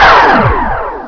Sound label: gun; asciisec